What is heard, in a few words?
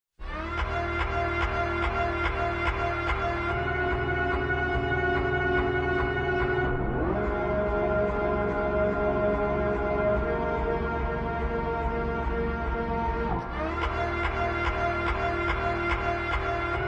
slowed reverb